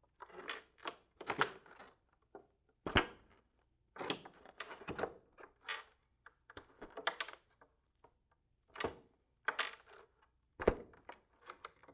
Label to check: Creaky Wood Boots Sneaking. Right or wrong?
Right